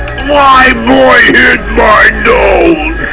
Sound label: death; grimlock